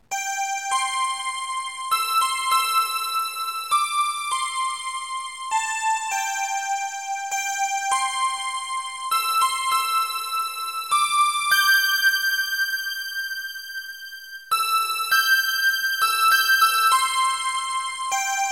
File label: amazing grace, harmonica